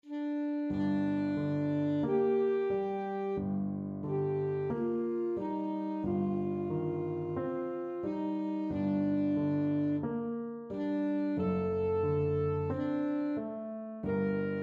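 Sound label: alto saxophone; il dolce suono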